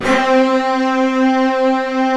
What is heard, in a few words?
SYNTH ORCH